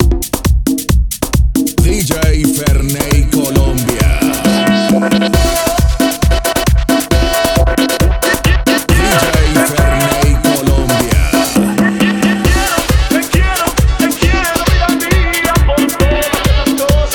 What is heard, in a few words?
135 BPM